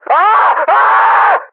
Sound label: death; custom monsters; mercs